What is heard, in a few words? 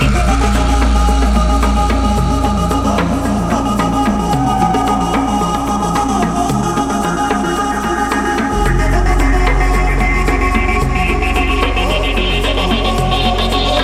shiver industrial float tank dub